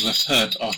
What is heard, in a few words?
Hurt; Phasmophobia